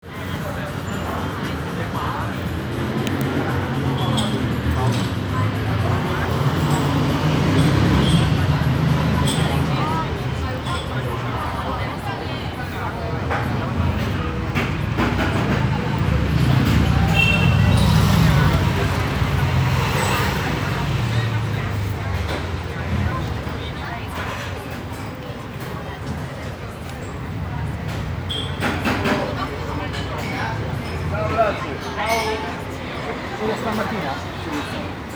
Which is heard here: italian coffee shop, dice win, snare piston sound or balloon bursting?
italian coffee shop